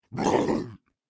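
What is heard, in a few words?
mutroop damage up, monster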